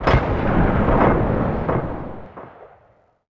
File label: hiker 1shot echo